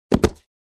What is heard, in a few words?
Feet Stomping